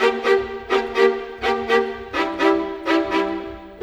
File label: Violins; Sonic Foundry (Sony Creative Software); Orchestral Series VOL-4; Rock and Pop; Rock-Pop 18